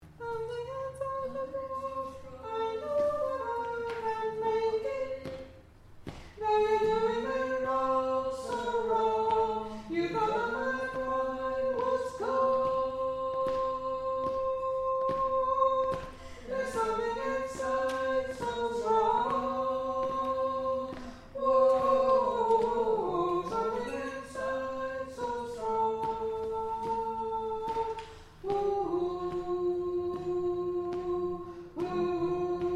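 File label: chorus tenor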